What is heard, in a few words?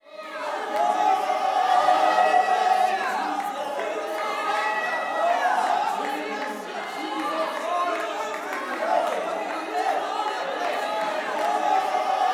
WORSHIP, Gospel; Spectrasonics; Vocal Planet; WORSHIP AMB